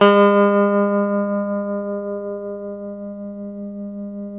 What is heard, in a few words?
TELE TREM A 3B, Guitars; Spectrasonics; Hans Zimmer Guitars VOL-1; TREMO-LUXURY